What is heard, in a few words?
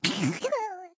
Monster Sleep; Cut the Rope: Time Travel